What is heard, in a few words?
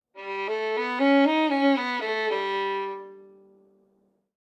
Violin Whole Note Runs